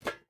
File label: footstep rubber boots metal jump start; Rust